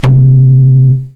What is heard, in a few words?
Electron Sidstation, Drum Machines